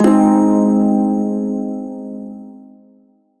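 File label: Shawzin Minor Chord; Warframe; Prime Shawzin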